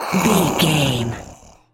zombie voice hiss

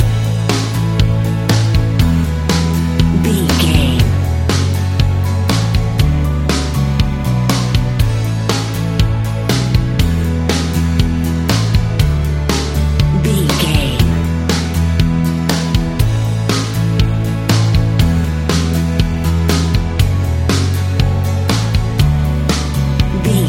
piano indie pop rock summer ballad; Piano Indie Pop Rock Summer Ballad 30 Sec

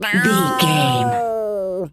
cat scream

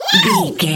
goblin fairy attack special

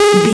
retro alarm siren loop

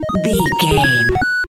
retro beeps collect item

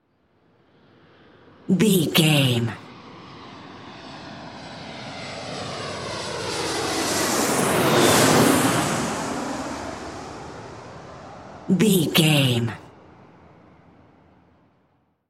airplane passby

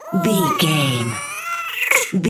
goblin fairy effort push

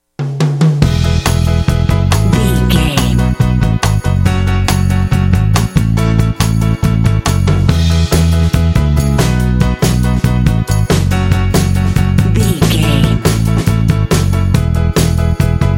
hawaiian beach breeze jingle bed

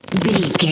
bow crossbow arrow draw stretch

